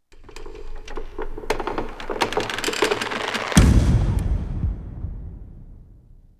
UNDERSCORE AND CRASH; Into The Woods

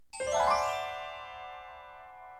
FANFARES; Into The Woods; ACT 1

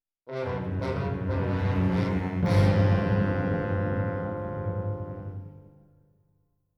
INCIDENTAL ORCHESTRA

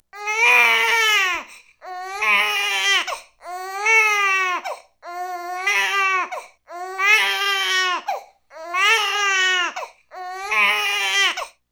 BABY CRY; Into The Woods

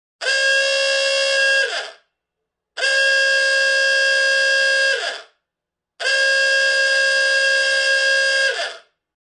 Oooga Horn Sound

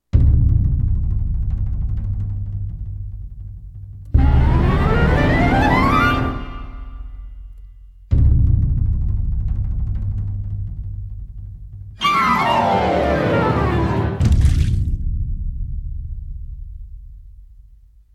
CUES; Into The Woods

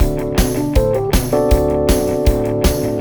vamp

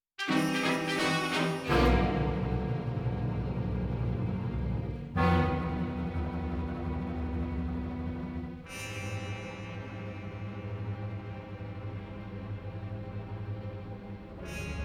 WITCH ON ROOF

